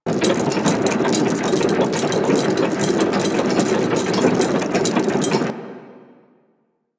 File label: Settlement Gear Loop